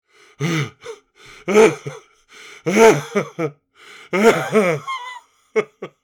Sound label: Aged man heartbroken sobbing